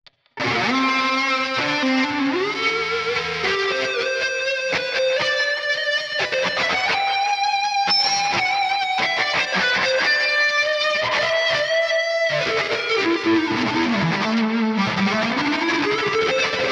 Neural DSP MesaBoogie Seaplus Lead

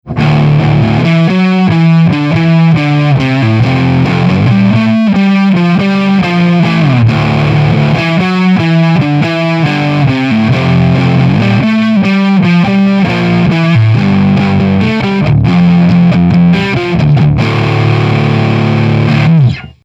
Neck Overdrive; Luna Sol

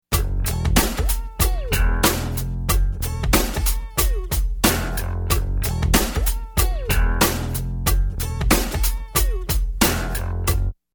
Loop Tube Comp

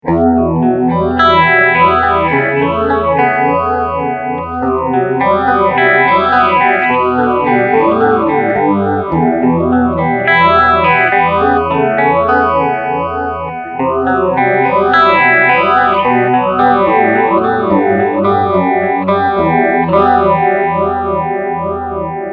12 Stage Phaser

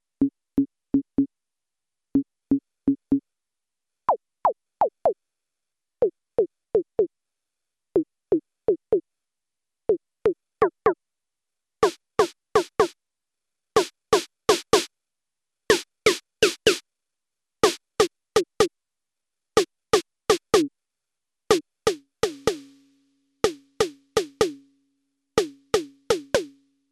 MODOR DR2 SNARE engine

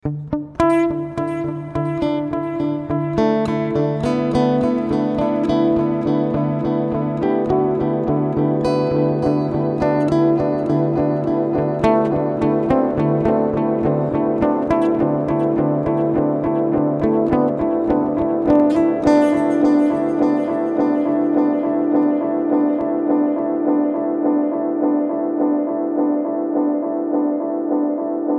delay

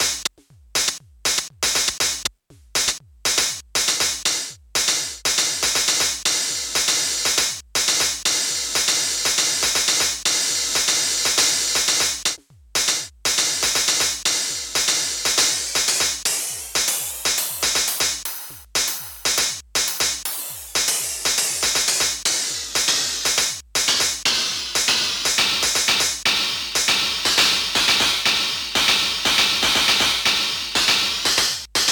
Queen Of Pentacles Sample Decay Pitch

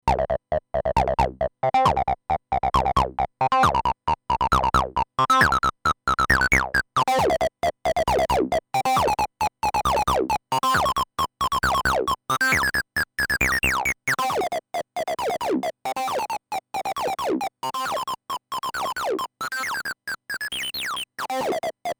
Roland TB 03 Overdrive

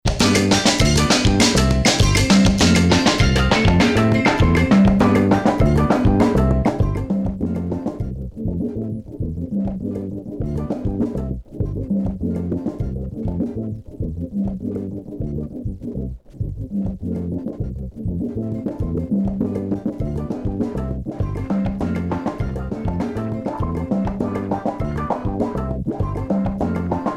envelope follower

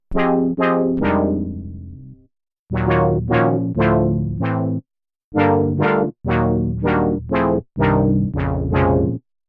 chords

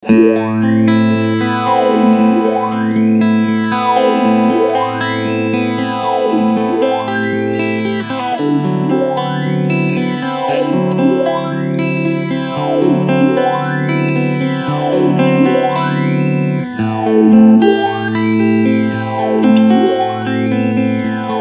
Phaser

